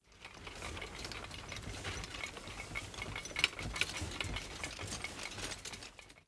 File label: BG Rattle; blue archive